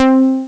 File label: Ringtone, Amiga Soundtracker; ST-XX Amiga Soundtracker Sample Packs